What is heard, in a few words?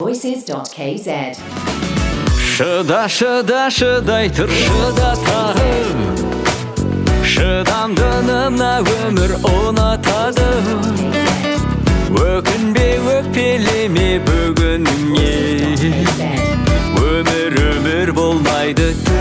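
Kazakh vocal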